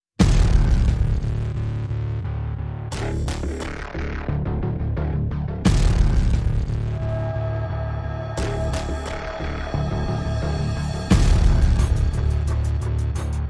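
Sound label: percussion and pulses only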